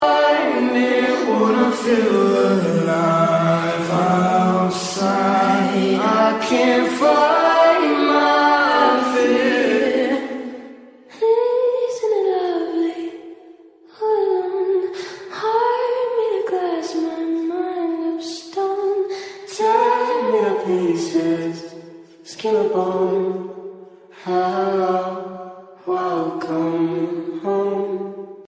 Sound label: Korean girl English song